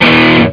error; Software Vault - The Gold Collection (American Databankers)

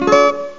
gtraccmaj

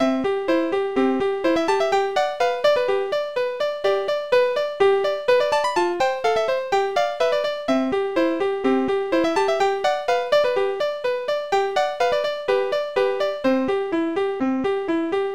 kikmuzak; Kikstart; Amiga

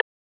808 plink; Mistergenerator

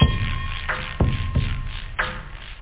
ping; da capo vol1

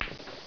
break; 12000 Graphics Pack - A Complete Graphics Collection For Your PC

